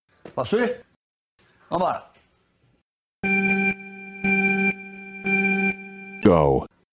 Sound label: beep; galvao